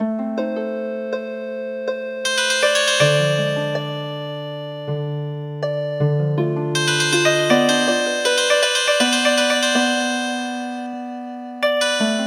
Harp

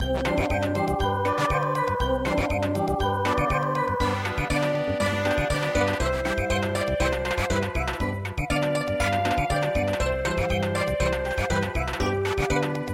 Samba

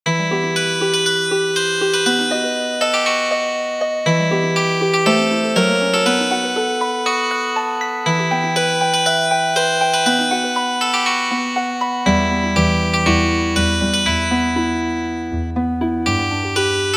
Harp

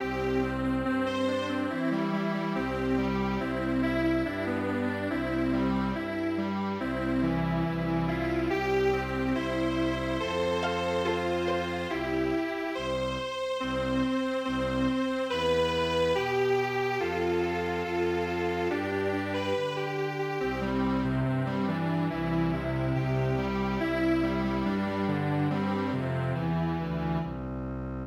strings; Chestnut Tree

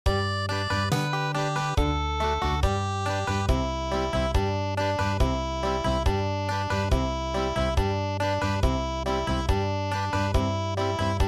Country, traditional; The Returning Hunter

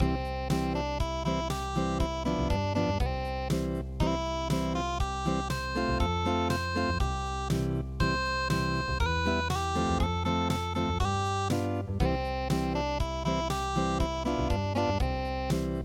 Chestnut Tree unpluged

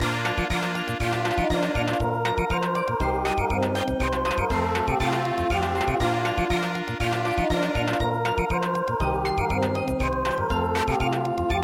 Samba; midi; traditional

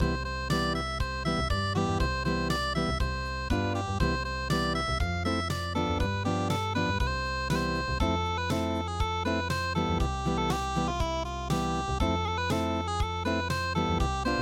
unpluged

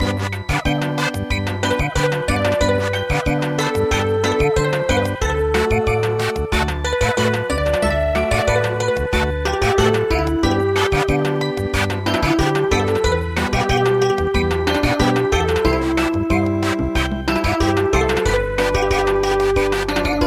Samba

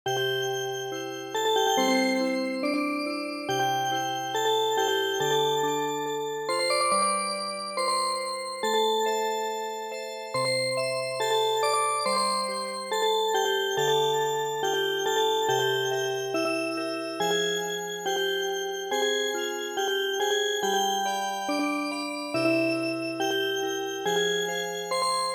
Music Box

